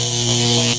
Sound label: zap